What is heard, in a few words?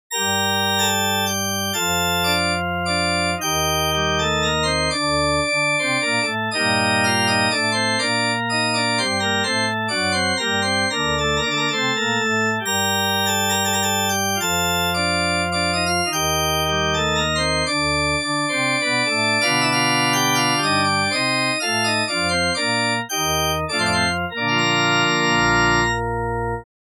Church Organ B, Minuetto in g minor, dry; Andreas Instrument Sounds AB